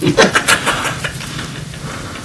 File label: Laugh Ringtone